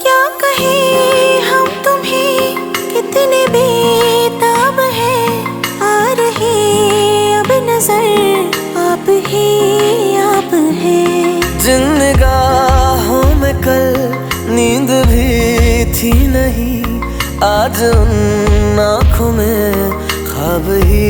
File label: Song Ringtone